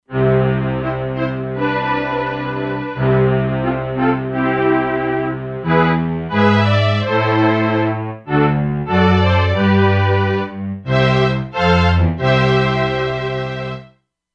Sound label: String Thing, E-mu Proteus-2; Factory Presets